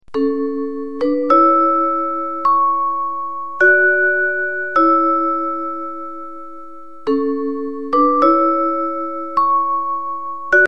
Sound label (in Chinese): 铃声 下课铃; 报时; 报时430